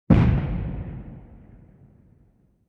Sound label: Explosion Large Medium Distant